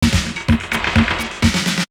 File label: Beat; AMG